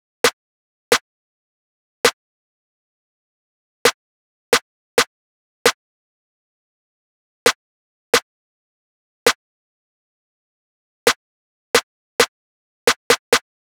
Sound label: Drum Loop 133 BPM Snare; Drum Loops; Loop Stems; Cymatics; Mystery Pack Vol 4; Enemies Drum Loop